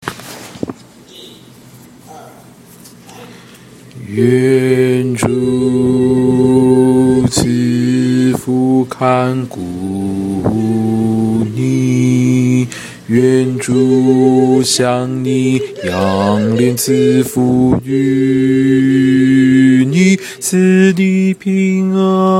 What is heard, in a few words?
bass